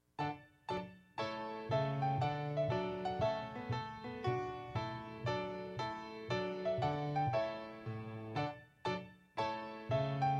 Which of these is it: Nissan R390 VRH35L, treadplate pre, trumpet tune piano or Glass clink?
trumpet tune piano